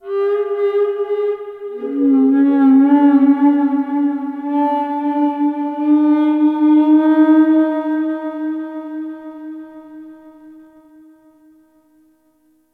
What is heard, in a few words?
Ocean Whale Bellow; Warframe; Grineer Ocean